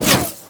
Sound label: Spin Whoosh; Dual Zoren Prime; Weapons